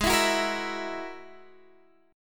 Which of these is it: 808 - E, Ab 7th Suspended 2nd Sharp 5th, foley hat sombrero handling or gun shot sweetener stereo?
Ab 7th Suspended 2nd Sharp 5th